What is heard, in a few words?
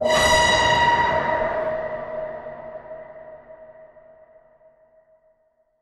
Siren